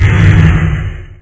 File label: zombie slaughter hit